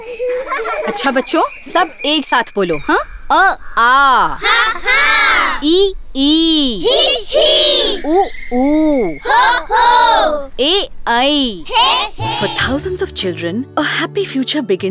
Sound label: Laughter; Project Crayons